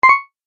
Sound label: Tap Select, button; multimedia sound effects